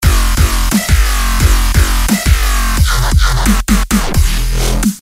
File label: bass